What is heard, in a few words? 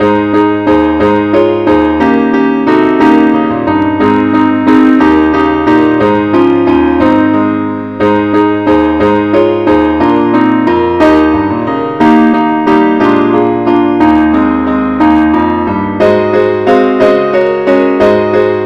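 keyboard only, key A flat; angelvoices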